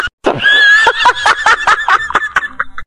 laught delirious

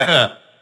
"heh"

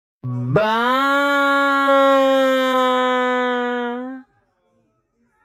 baaaah lento

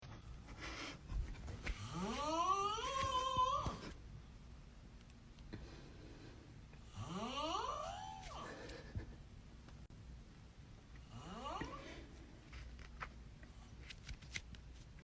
snoring guy

